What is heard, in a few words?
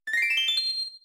Mining Fully Reveal Item; Pokemon Essentials; Pokemon Iberia V1.03